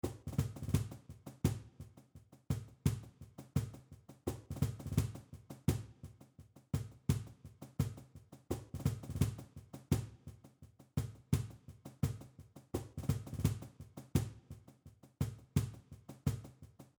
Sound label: fandango 170, flamenco